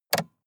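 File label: sports car interior switch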